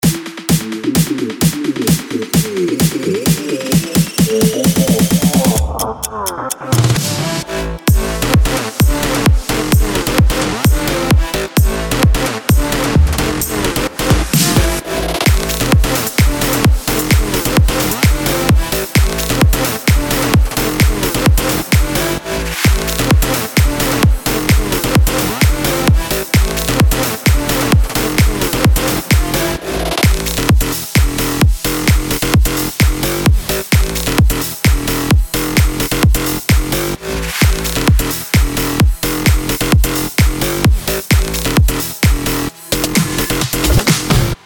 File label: bass box; MK STUDIO